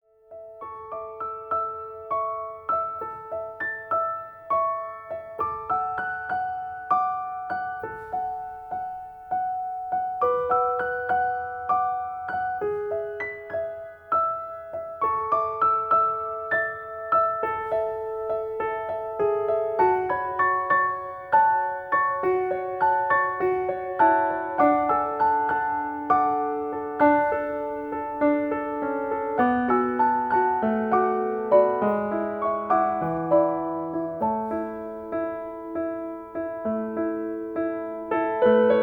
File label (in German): Klavier Solo